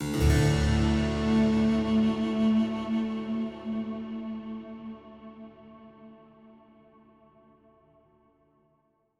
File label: Stingers sting neutral